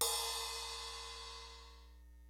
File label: LIVE AC KIT, DRUMS; Kid Nepro; Elite